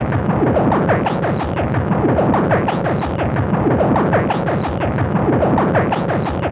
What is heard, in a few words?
Crude Tape Loop, Tape delay; radiosonics